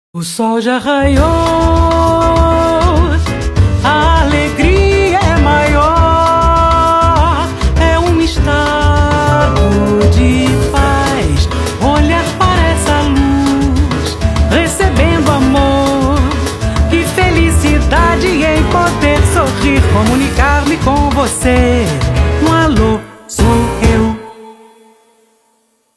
Nokia 6260 slide Ringtones; O sol

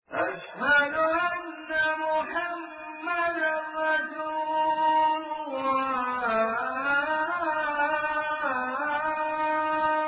azan; Spreadtrum

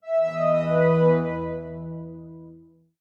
Portal; Retro Riffs

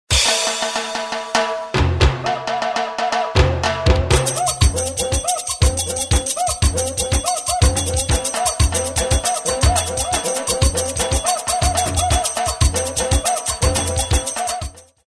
SAMBA

